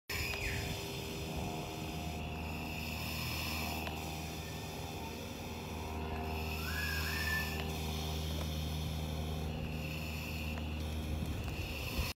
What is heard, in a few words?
Diving mask with capsule; ماسک غواصی با کپسول